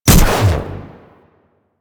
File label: Electro Mag Shot, Weapons; Warframe; Corpus